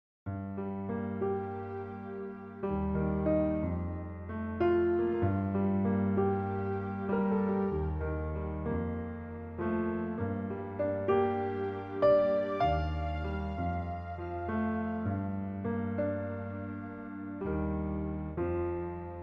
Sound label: Piano cover